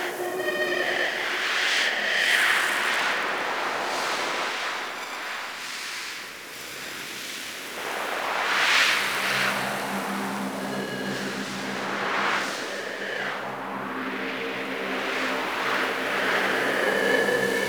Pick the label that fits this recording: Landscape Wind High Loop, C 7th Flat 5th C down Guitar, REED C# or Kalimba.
Landscape Wind High Loop